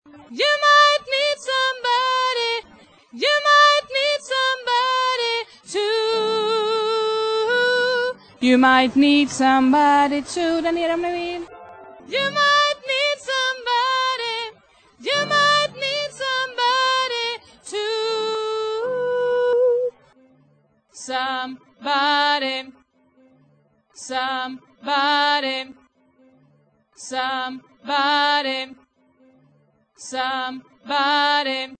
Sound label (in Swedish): bas, stammor